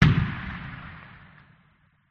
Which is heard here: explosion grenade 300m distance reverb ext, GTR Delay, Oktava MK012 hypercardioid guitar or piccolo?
explosion grenade 300m distance reverb ext